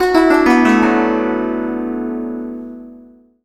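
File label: guzheng; Spectrasonics; Heart of Asia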